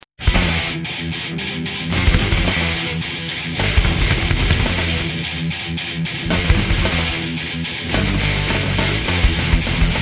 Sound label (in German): Rock